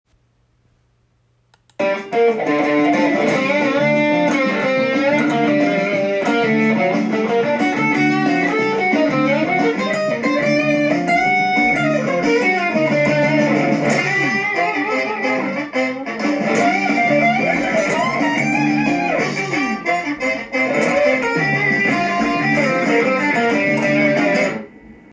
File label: adlib solo, guitar